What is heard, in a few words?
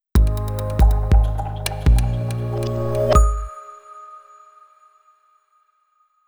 Jumping on; Alcatel 3 OT-5052D; switch on off